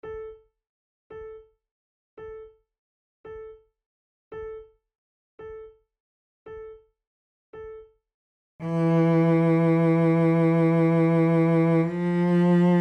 adagio, flute

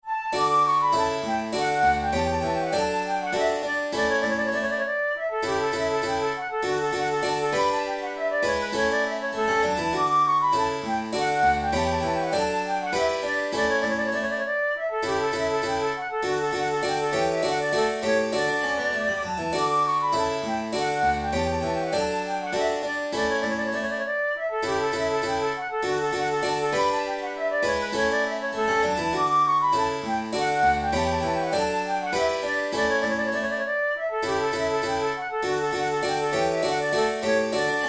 flute sonata in d major allegro; vinci

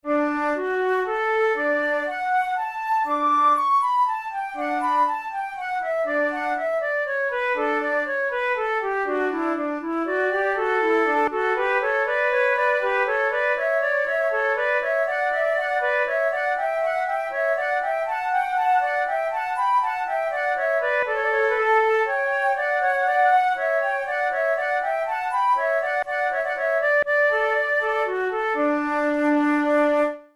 prelude, flute

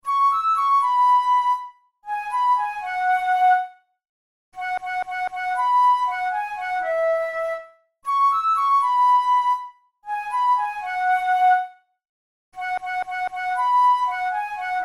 flute

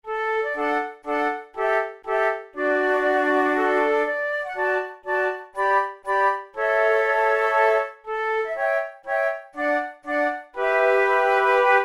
andante trio, flute tunes

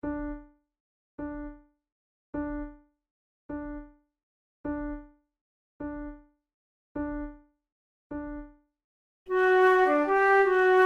sonata for two flutes in B minor amoroso

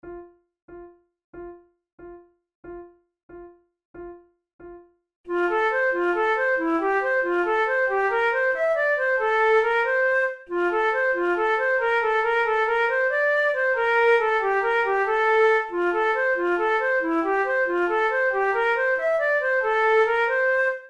duet

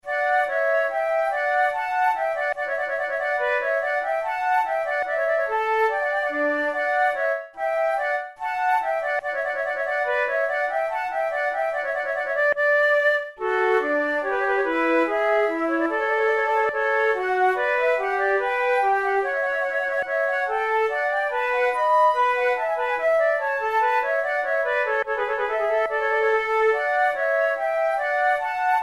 two flutes air; Monteclair; 6 concerts; flute tunes

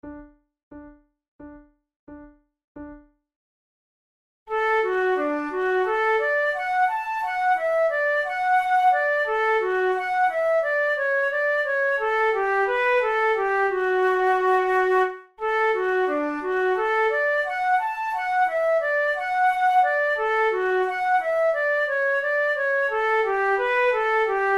duet; weigl; leonore

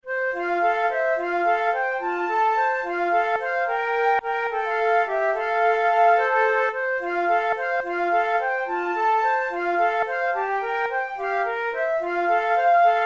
an old man would be wooing duet, flute

